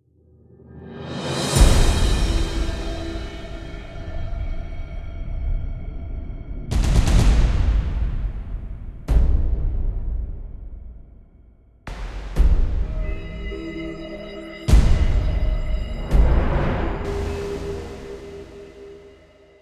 Boss Tension; Mass Effect 2

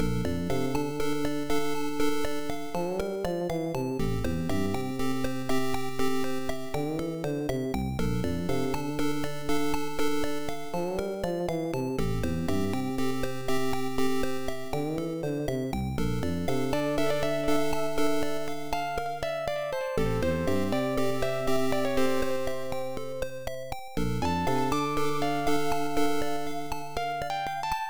Steady Note; Night Slave; PC-98

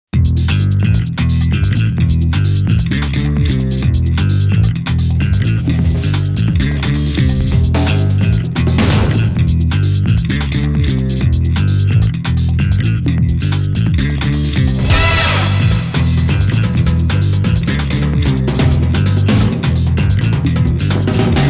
Stage Forest

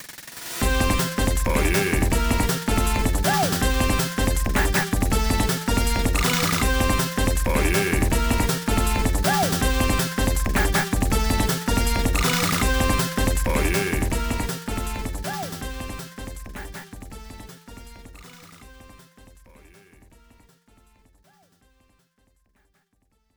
Story Sequence; Ninja Baseball Bat Man; arcade

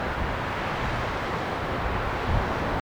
SFX loop; Disney's Dinosaur

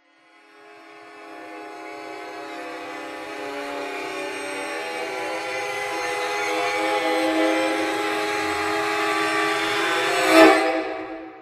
Combat Strings Pad

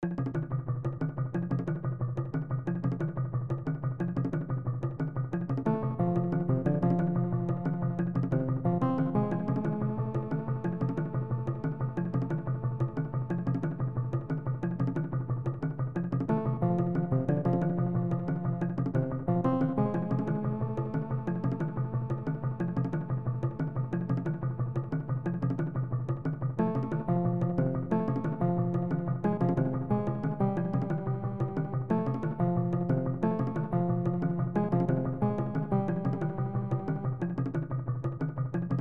Cave; SMW Remade From Memory 2021; Yoshi

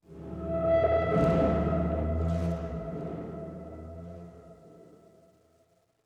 Dark Ambience Sting; dead rising 3 apocalypse edition

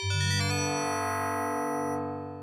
Potion; prince of persia; ibm pc at

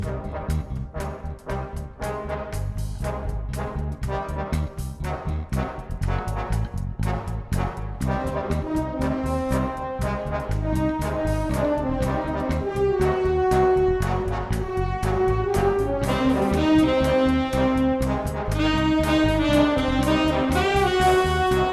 Action

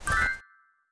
Panel Towel Grab Map; Among Us

